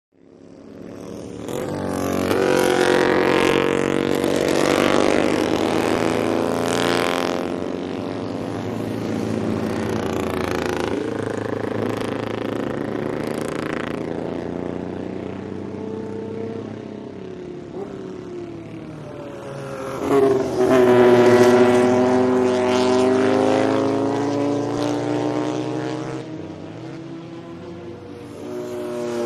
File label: Motorcycle Road Racing